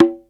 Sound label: HI CONGA; E-MU Formula 4000 Series Vol. 1; Hip Hop Nation